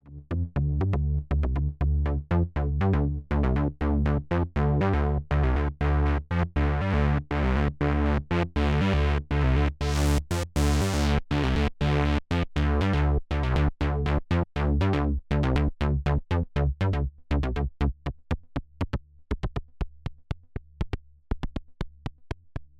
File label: dreadbox Erebus V3 Filter Envelope